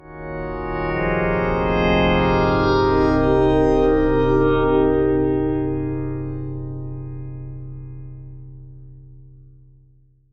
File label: Saved Game; frogatto friends